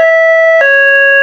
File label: hilo, sirens; whelen std